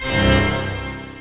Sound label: full pipe organ; OctaMED SoundStudio V1; mirage